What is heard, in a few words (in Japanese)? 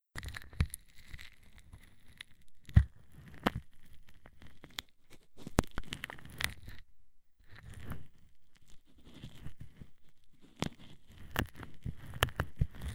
耳かき(右耳)